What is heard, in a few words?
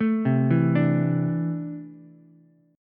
C 6th Add 9th C down Ukulele; arpeggiated; JGuitar